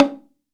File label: BONGO, STEREO BONGO